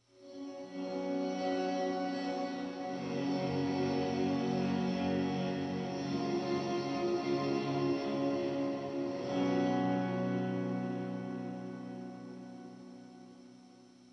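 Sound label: square drone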